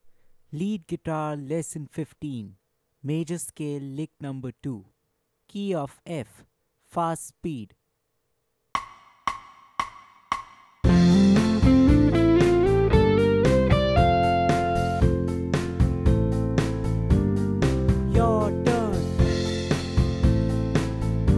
Major Scale lick Playthrough Fast Speed Key of F, lead guitar; The Art of Lead Guitar; Major Scale lick 2